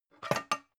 pan handle

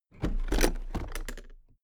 toolbox open heavy

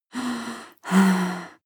breath female moaning breath

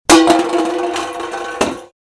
paint tin falls rolls off

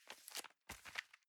envelope swap soft

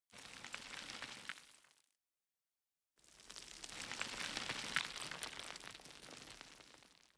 cave oneshot dust

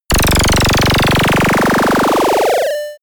heavy machinegun

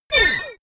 sci-fi laser shot

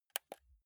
console controller joystick press

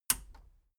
metal button radio release power on

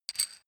metal screw nut single

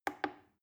plastic button little click soft slow

